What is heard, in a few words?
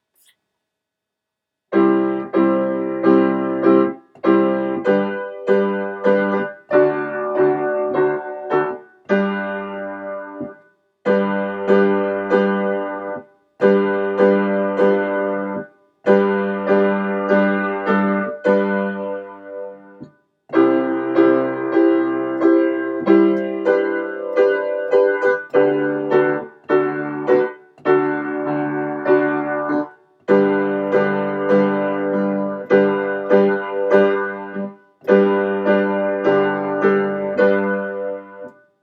jingle bells recorder